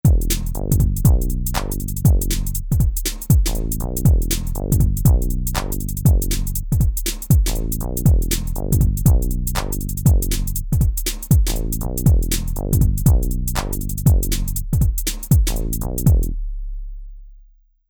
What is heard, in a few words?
Rap Hip Hop